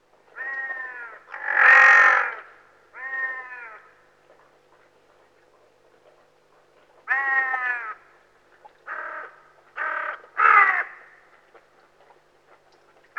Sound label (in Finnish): Varis; lintuja; nauhalaisen aanimaailmat